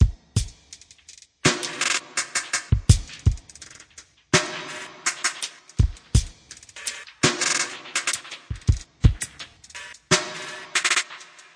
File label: 083 bpm glitch my snare